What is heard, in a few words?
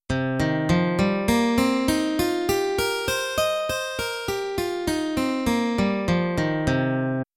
c minor pentatonic scale; Learn strumming, alternate picking, and sweep picking